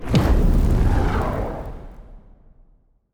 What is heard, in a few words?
crash launch